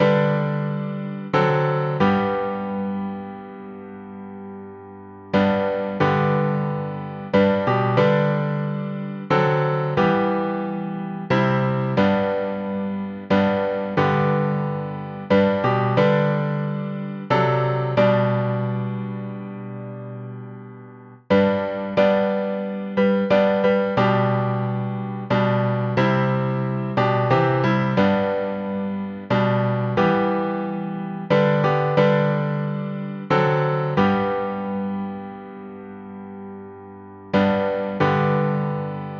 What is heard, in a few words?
Amazing Grace G Hymnal